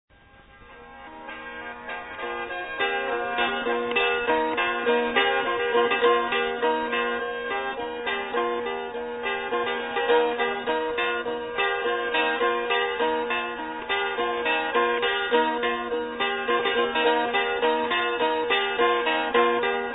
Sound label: Lao Instrumental Music; Khmu Epic Folksong; Khui Khmu; Luang Prabang/1988